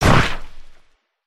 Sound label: Man Eater Shoot; weapons; aronwk collection; ndaudio